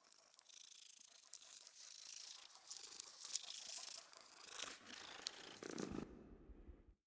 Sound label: Creature; We Are the Dwarves